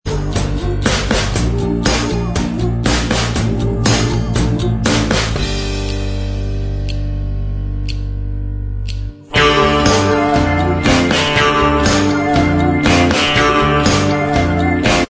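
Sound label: Bvox Track, backing vocals track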